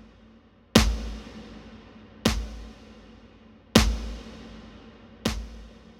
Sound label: Cellular, ambient